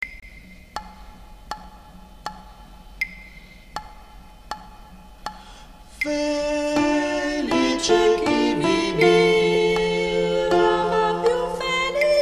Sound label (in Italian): Altre Voci Contralto, CORO